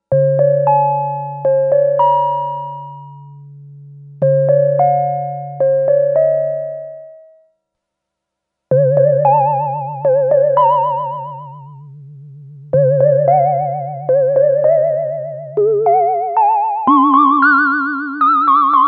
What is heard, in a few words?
DELAY VIBRATO